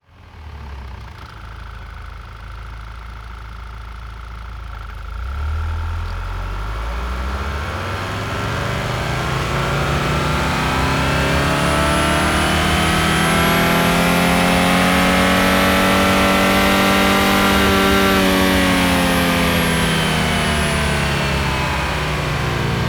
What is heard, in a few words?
audi a4 allroad 20TFSI engine different rpm ramps; mono; SoundHolder